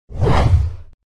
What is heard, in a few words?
fast woosh